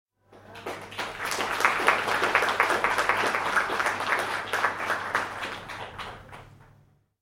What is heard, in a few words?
small theatre audience clapping